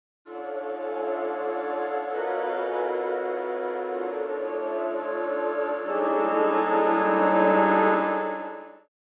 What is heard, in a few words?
Traditional Flutes Wide Cluster; VENTO